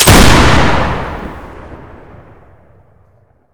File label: flint buck; garrysmod